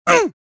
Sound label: choke